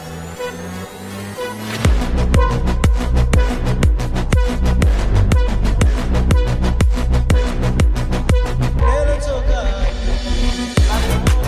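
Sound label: ARABIC background music ringtone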